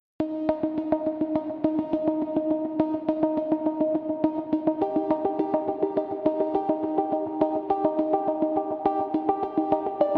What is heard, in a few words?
Documentary Soundscapes Light Serious